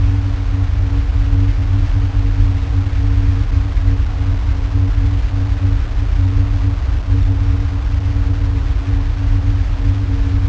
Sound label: drone; ゆめ2っき